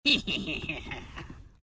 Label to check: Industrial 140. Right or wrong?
Wrong